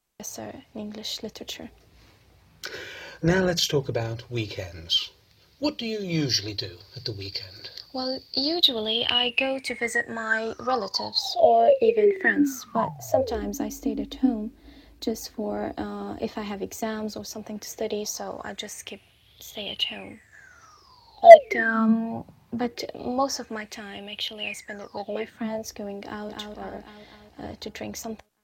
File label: Manual Phaser